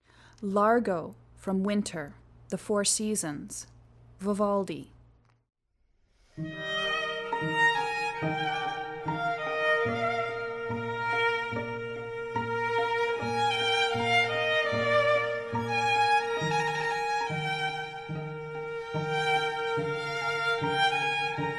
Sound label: Concerto in F Minor; Pan String